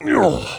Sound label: pain sharp; garrosh